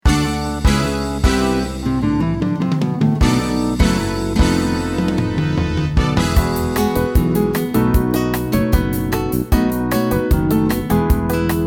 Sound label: Salsa, Gitaarboek